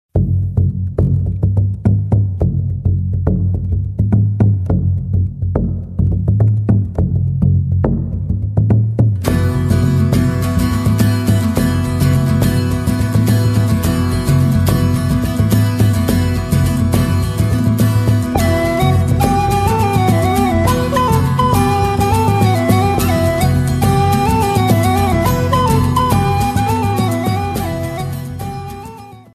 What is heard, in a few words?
bodhran; bodhran en mode "résonnant"